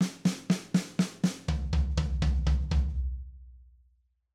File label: Triplet Fill, drum